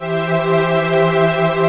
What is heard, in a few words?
PAD